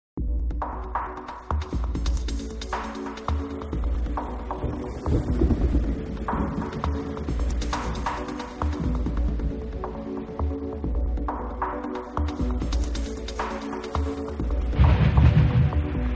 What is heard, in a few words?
Thunderstorm Mix